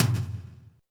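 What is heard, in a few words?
tom, real mega drums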